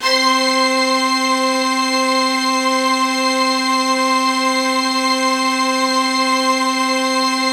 Synths HiString C; Vengeance Samples

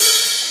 Open Hihats, Cymbals; Vengeance Samples

